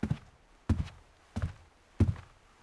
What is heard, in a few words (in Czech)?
intro kroky; Mafie 1